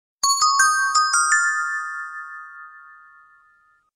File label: Android SMS